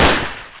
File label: Deagle